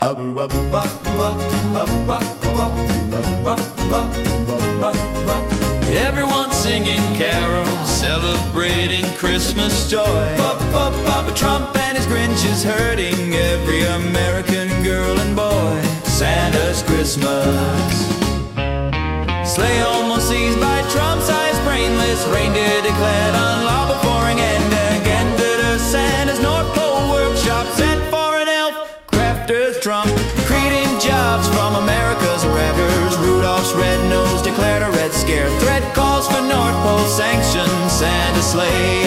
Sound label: Everyone singing Carols celebrating Christmas; Christmas doo wop dance